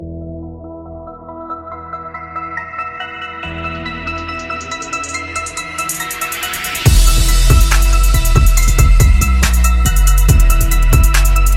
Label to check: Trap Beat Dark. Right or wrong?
Right